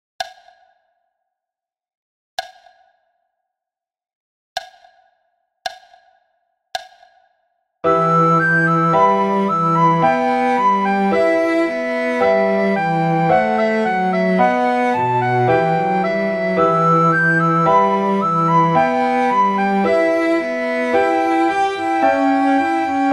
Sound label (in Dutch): Badinerie sopraan; Flierefluiter 6